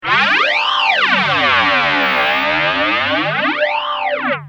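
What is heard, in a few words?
guitar, ADA flanger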